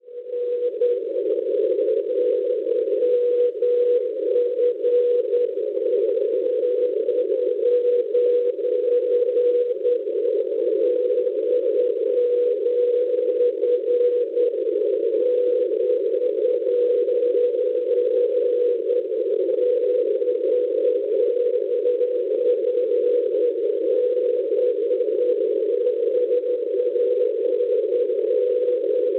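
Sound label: non-directional beacon 421 kHz; BL; Borlange; Svezia; Schwarzsee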